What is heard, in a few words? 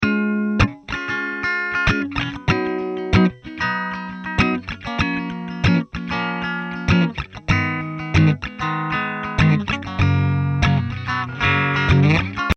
amp Test